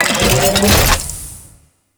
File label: Fortress Roller Turret Deploy; Ambience; Warframe; Grineer Fortress